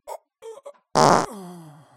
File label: Dwarf fart